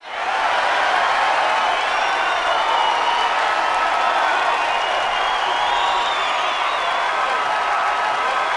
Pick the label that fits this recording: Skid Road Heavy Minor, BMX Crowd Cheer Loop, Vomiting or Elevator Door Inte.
BMX Crowd Cheer Loop